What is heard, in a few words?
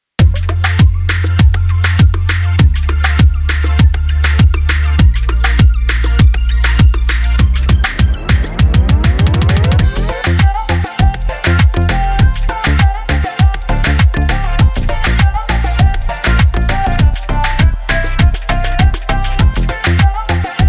BGM rhythm BPM100